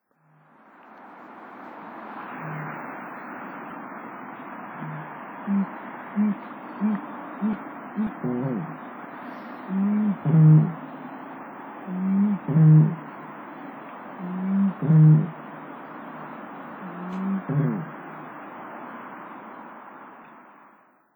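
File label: Eurasian Bittern; ペリカン目; サンカノゴイ; 栃木県渡良瀬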